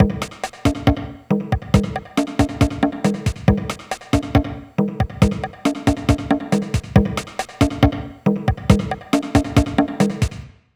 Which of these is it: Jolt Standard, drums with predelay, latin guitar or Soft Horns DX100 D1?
drums with predelay